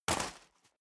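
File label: step boots gravel medium single left, footsteps; schritte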